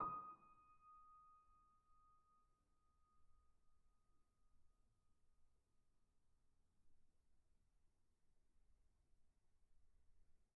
D#6, bitKlavierGrand Binaural

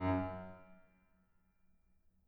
harm F#2, Grand

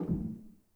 rel, Grand; bitKlavier; Binaural

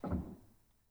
rel, Grand

